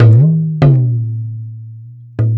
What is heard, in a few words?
Talking Drum; Sonic Foundry (Sony Creative Software); Ambient Atmospheres & Rhythms